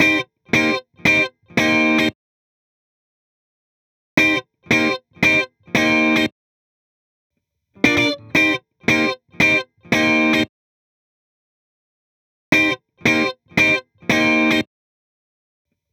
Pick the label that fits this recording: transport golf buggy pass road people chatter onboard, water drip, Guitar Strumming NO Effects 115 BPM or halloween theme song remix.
Guitar Strumming NO Effects 115 BPM